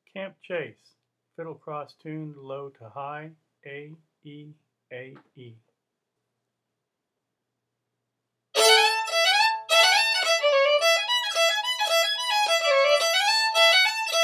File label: fiddle; festival tunes